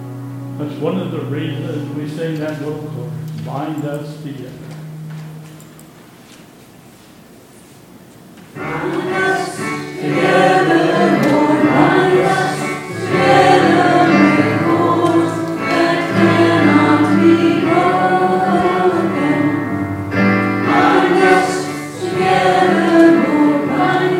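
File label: Closing Chorus, church service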